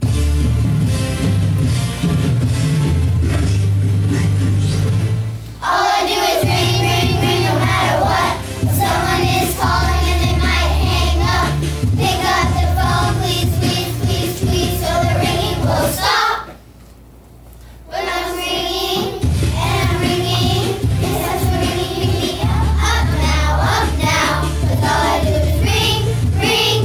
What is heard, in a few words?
ringtone